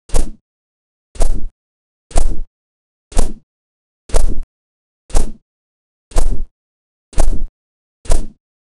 wooshing sound of object

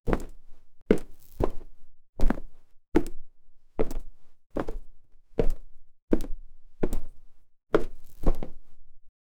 handbags hitting

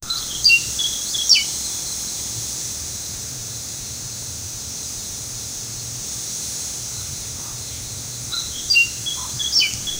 musical

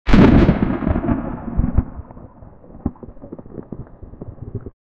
intense thunder crack, tail

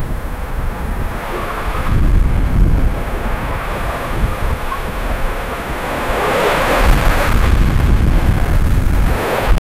sound of a ship saling, sea

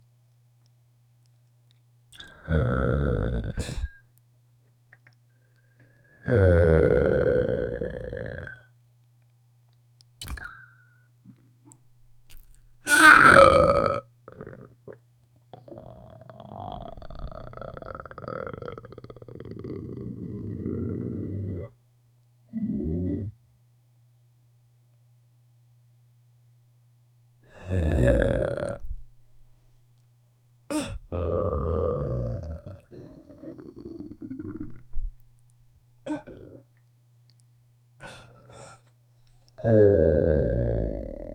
stomach growl digestion

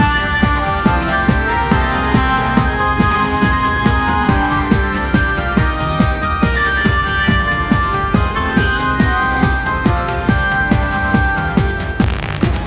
upbeat music like, traditional; cuphead

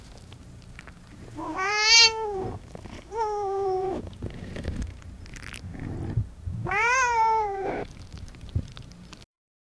cat paw scrapes sliding; fog

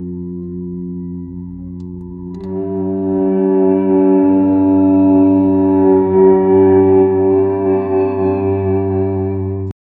violin in a frozen landsc; low-volume

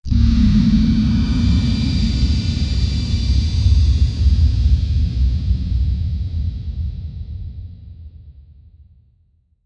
mine bomb fantasy, detonation

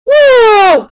knight yell; mmo spiel

